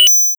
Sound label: wave Complete; towerDefense; wave game sounds; Toybox